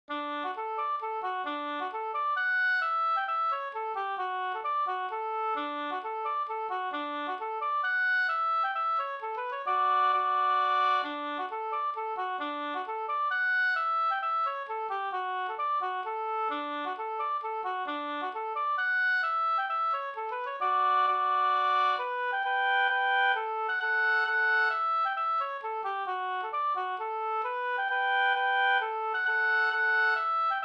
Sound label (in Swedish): hambopolska D dur; fr Varnhem nr1 av H Falk